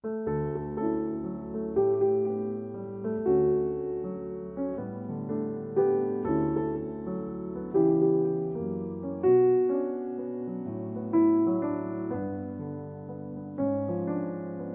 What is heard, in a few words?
Solo Piano